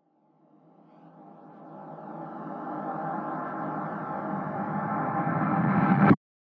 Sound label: Riser